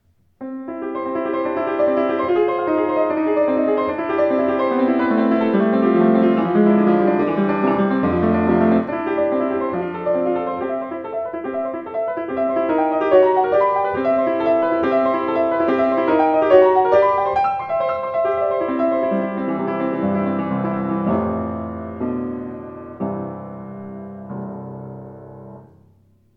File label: Steinway Z Debussy, Piano; 115 steinway z nussb